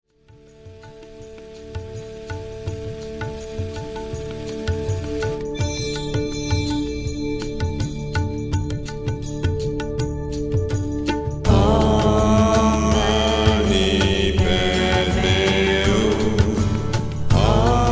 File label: OM MANI PADME HUM RE; LE SOIN PAR LA MUSIQUE; ORANGE